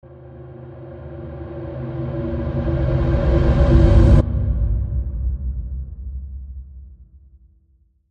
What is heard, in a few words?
Ominous Reverse